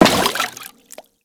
Crewman Step Water, Footsteps; Warframe; Corpus

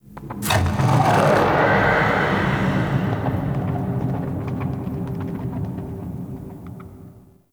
Ghost Horse; Ambience; Dagath; Warframe; Clan Dojo

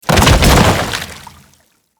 Fall Water, Body Falls; Grineer

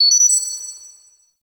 Class UI Correct; Warframe; The New War; Zariman Class Quiz

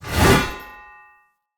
Great Sword Whoosh Heavy; Weapons; Player; Mendicant Great Sword

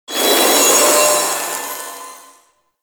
Death Cast; Warframe; Dante

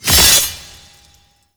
Siphon End; Warframe; Infested Lich

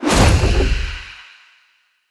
Soul Steal Available Enemy

